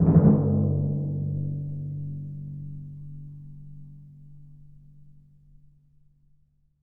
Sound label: TMP, Synclavier Percussion